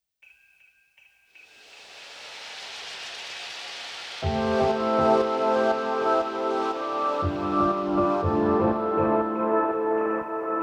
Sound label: Christmas House Beats; carol of the bells